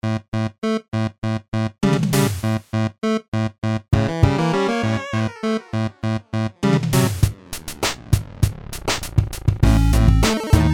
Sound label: Drum Check